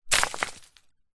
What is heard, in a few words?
foley stone drop ground light debris